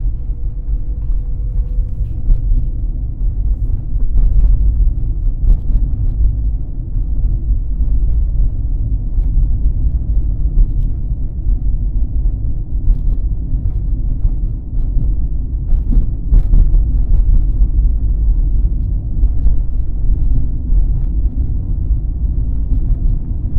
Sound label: engine hum